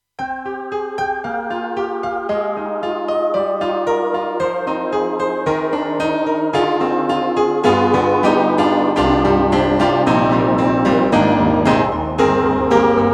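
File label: Aero Piano; YAMAHA TG300 GM-B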